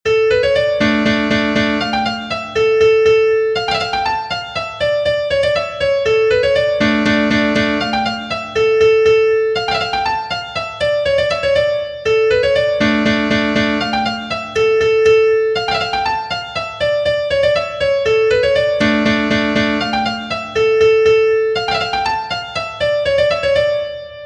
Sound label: Polska